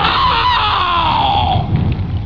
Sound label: pain